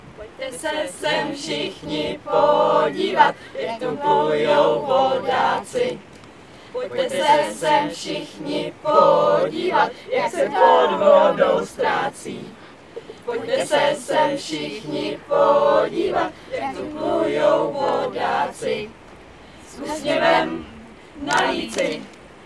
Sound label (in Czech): Helikoptery